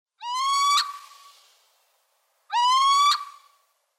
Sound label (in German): Steinkauz Athene noctua; Vogelstimmen